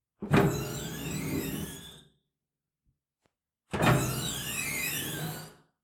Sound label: Sci Fi Mechanical Door